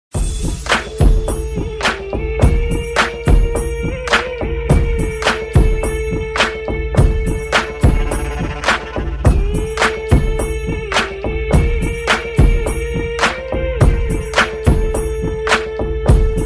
button song ringtone; android

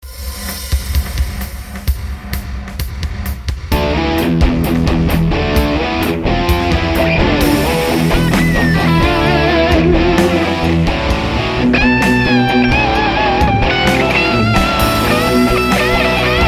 80s rock ringtone

